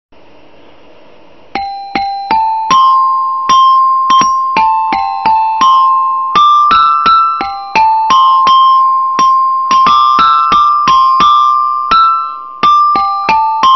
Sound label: Folk Song; Haiku Chimes